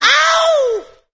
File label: ow, Voice ooh ahh ow ouch